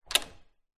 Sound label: household front door handle turns hallway reverberant; zapsplat